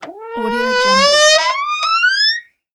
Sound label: squeak door